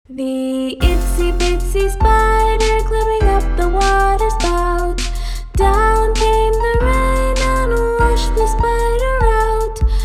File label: Drums Mix